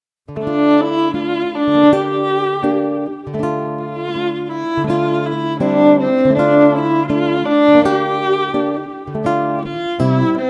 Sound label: Duo of Guitar & Violin